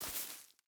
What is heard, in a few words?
footstep boots grass walk; Rust